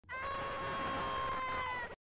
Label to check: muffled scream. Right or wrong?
Right